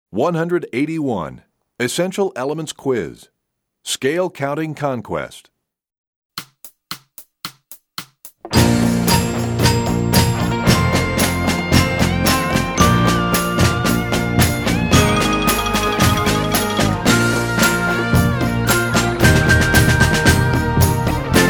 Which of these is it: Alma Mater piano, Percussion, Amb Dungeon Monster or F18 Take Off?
Percussion